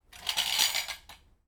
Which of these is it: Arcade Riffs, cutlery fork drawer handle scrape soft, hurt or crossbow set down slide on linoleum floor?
crossbow set down slide on linoleum floor